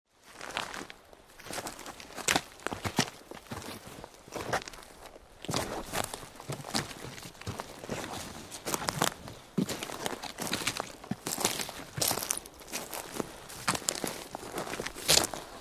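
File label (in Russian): шаги по лесу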